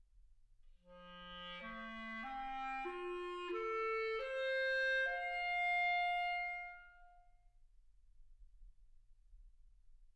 clarinet

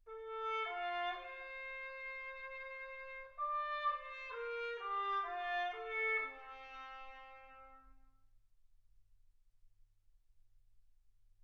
trumpet

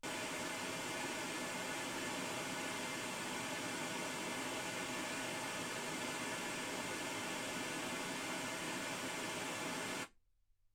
wgn

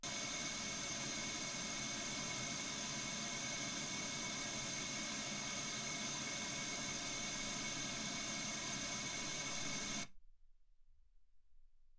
wgn

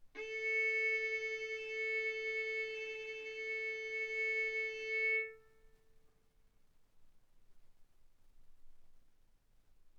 Violin arco mf sul A A4 B4 stereo

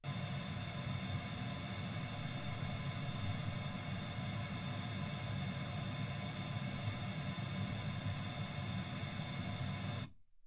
pink noise 48kHz

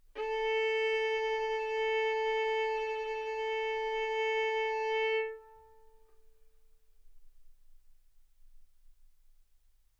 Violin arco A4 B4; mf; sul A; stereo

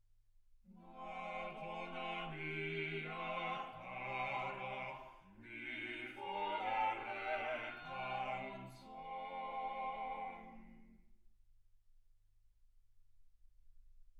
quartet

